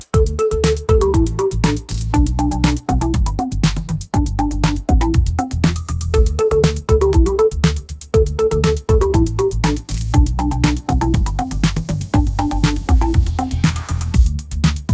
pop ringtone

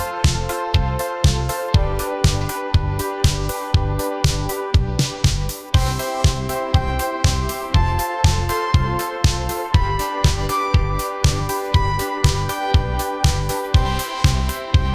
upbeat pop melody; ai-generated

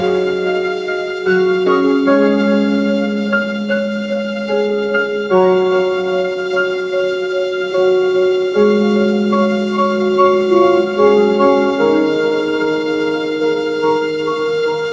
orchestral strings; ai

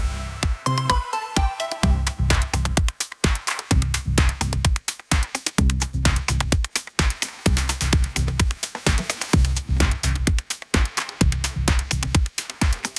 electronic dance music

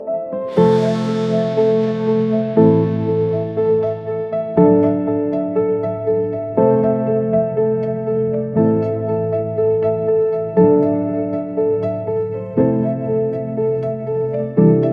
upbeat pop melody

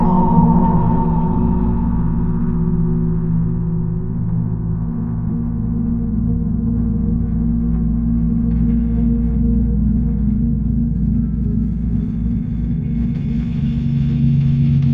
ambient soundscape, ringtones

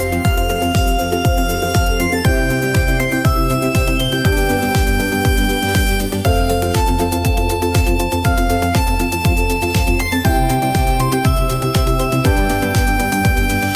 upbeat pop melody, ringtones; ai-generated

